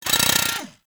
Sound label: Ghoul Drill Punch; Warframe; Grineer Ghouls; Ghoul Drill Sergeant